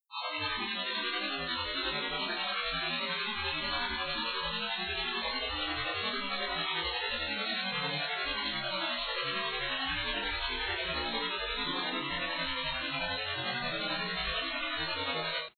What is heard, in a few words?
tone